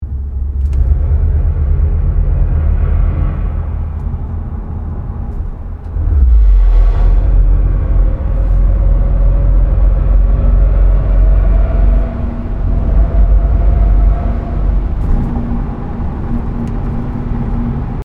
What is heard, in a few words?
Roaring Pulse